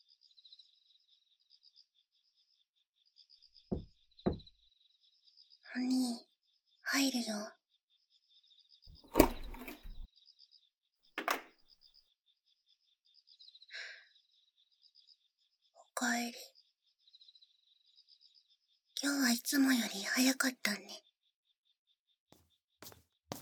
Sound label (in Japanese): 耳かきしよ(左右耳かき) 環境音あり